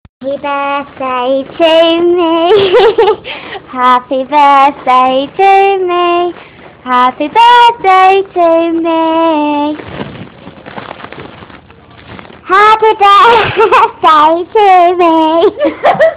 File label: happy birthday to me